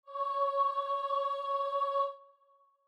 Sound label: starting note, voice; prelude to a march